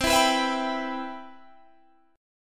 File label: C Major 7th, Guitar Standard; CM7 strummed; JGuitar